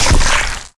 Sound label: splatter